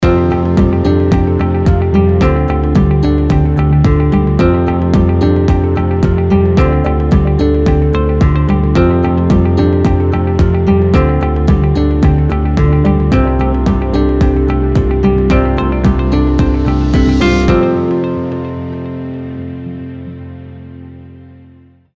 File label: Bright and clean